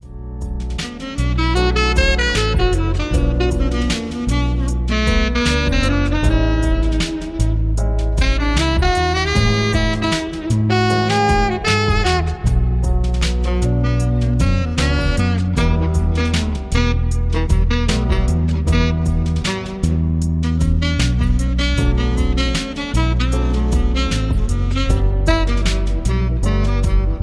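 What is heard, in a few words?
jazzy Chill Jazz in the Café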